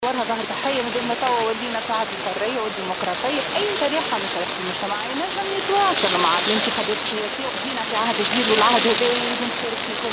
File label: 684 khz; ERTT